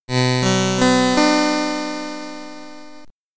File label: Game On!, SID Stereo, Commodore 64; Draconus